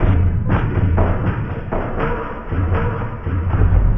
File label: Elektro Brood, Drums; Sonic Foundry (Sony Creative Software); Friendly Fire